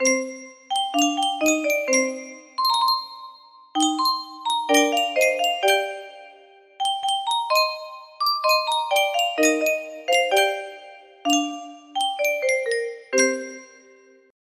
music box, music box melody

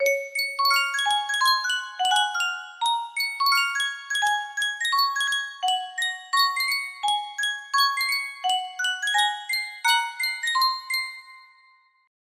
sankyo music box

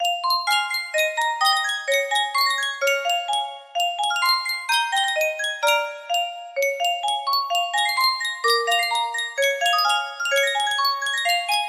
yunsheng music box

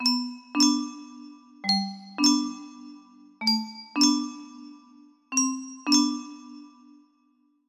music box, music box melody

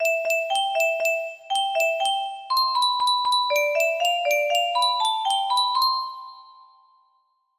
music box melody

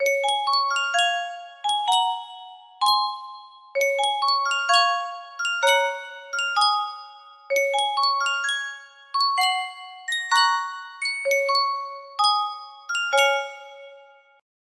yunsheng music box, music box melody

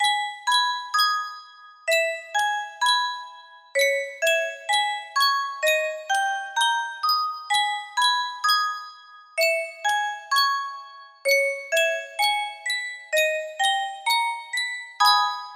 Yunsheng custom tune music box

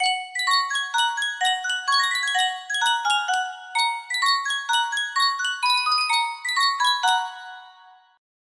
sankyo miniature music box

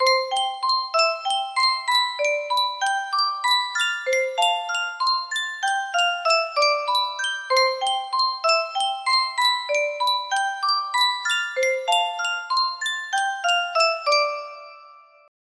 Yunsheng Music Box, 169 BPM, music box melody

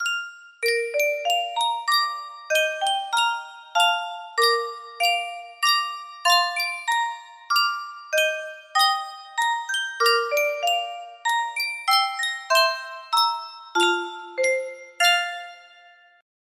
yunsheng music box, melody; saint kitts and nevis national

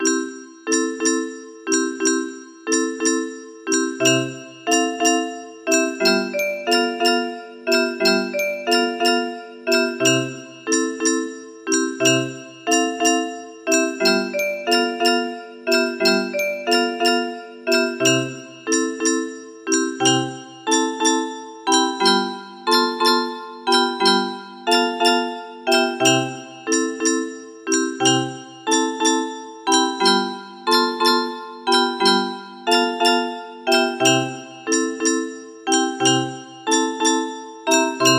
barcarolle, music box

